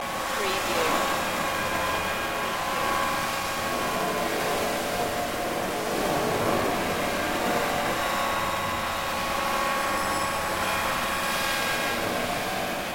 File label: electrostatic sparkle ambience loop